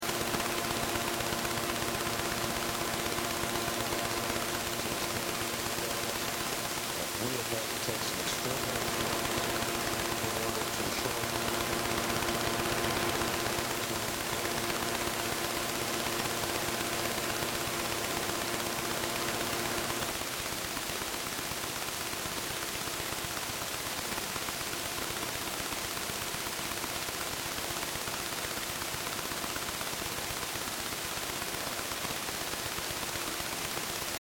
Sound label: Sony Walkman Static: Bad reception, electric buzzing noise; with very faint transmission coming through